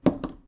Smudge drop; Phasmophobia

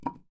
Wood Impact; Phasmophobia

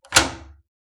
Door key lock; Phasmophobia

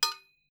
metal pipe; Phasmophobia

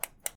Flashlight off; Phasmophobia

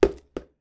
wood; Phasmophobia

